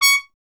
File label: trumpet; Miroslav Vitous; Solo Instruments VOL-1